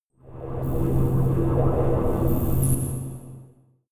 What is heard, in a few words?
space machine alien ring high